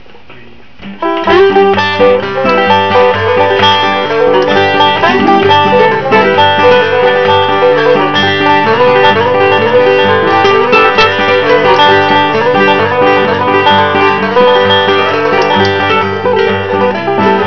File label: banjo